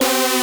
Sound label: synth